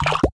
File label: pull; lego my style preschool